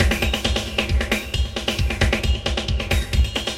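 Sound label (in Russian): соло на барабанах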